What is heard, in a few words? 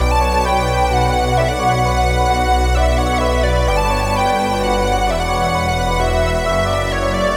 130 BPM B Min, Synth Loops; Lights Out; Cymatics - Fantasy Synth Sample Pack